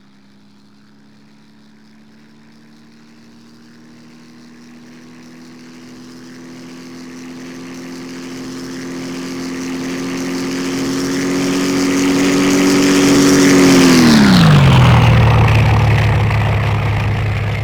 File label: Yak-9UM FLYBY; engine sounds